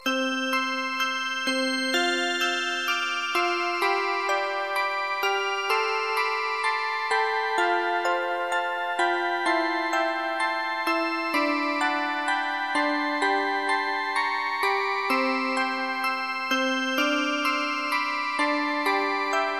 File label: Starry Lullaby